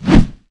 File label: Swish